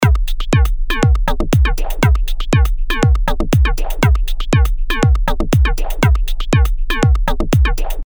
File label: dance call 120bpm